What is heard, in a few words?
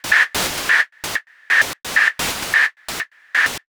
Gated Seq Noise, Gate Filtered 130BPM; noise hiss crackle